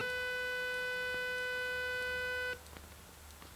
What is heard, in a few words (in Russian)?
hdmi гул писк свист шипение шум